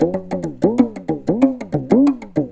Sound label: PAPANG, Indian Traditions; USB Soundscan vol.45; AKAI